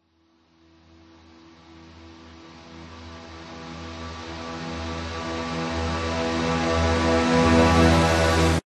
sound design cinematic riser reversed orchestral hit